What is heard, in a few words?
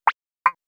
Boink double high low